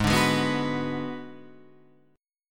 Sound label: Ab Minor 7th Flat 5th Ab, down, Guitar, Standard; strummed; JGuitar